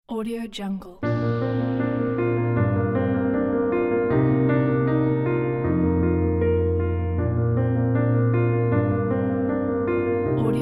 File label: inspiring ambiance piano